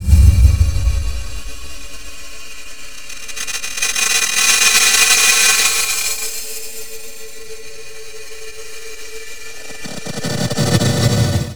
SPACE NOISE; Big Beat Samples & More; AKAI

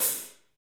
HH HH

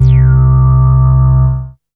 C3, BASS

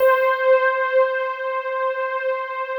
PAD, DIGI-PAD

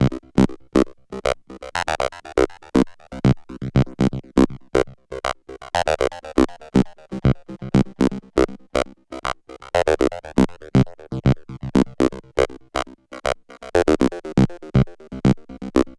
Quack Seq; Dr. Fink's Funk Factory

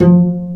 Cb Pizzicato, String; Cb Pizz 3; Roland - String Master Series

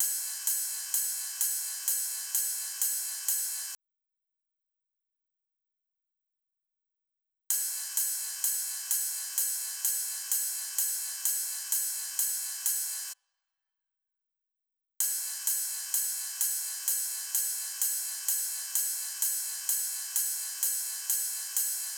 Ride, Drum Loop 128BPM; Phalanx2; VEC5 Drumloops; VEC5 Multi Loops 128BPM; Loop Elements

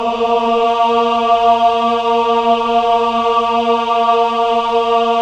MEN AH, Choir of Ahs; Roland - LA Composer vol. 1

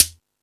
Percussion

